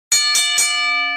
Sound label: wrestling bell